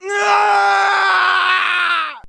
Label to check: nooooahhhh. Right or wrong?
Right